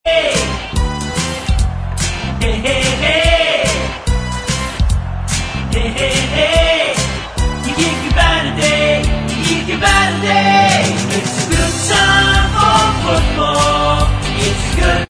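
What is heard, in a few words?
backing vocals, backing tracks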